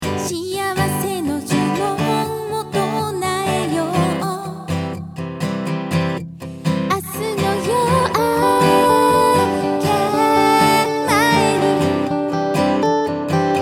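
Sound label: acoustic guitar vocals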